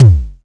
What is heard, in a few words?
tom, Tama TS-305